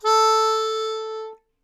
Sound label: Melodica Long, Long Notes Chromatic